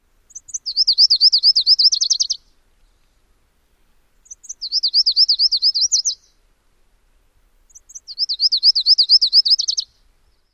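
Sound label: songs, bird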